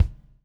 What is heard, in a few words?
BUBBLE; Spectrasonics - Backbeat